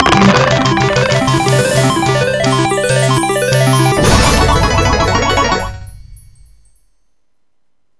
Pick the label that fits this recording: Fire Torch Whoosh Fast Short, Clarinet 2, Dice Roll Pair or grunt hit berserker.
Dice Roll Pair